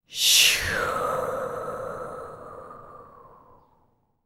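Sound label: female breath; Spectrasonics; Vocal Planet; Jazz FX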